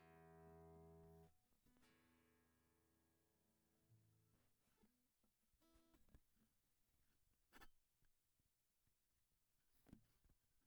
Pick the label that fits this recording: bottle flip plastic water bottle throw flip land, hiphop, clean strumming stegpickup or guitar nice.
guitar nice